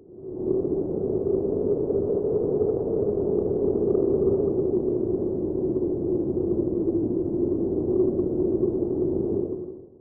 snowstorm weak, weather

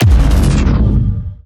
laser cannon, Gunshots; Weapons

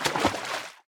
slosh, Footsteps Water

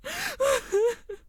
cry female, Voice Human

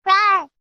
rawr, Toys

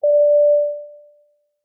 notice

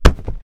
bodyfall; civ13; civ14

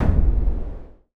large thud, Footsteps

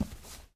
carpet, Footsteps

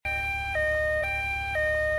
ambulance siren, vehicles

